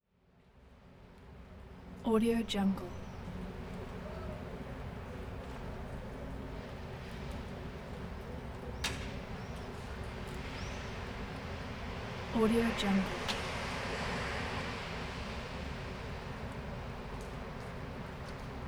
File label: peaceful evening ambience